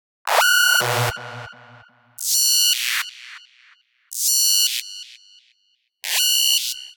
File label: Alarm G# 125 bpm; MIDI Clips; Ableton; Factory Packs; Skitter and Step; Flex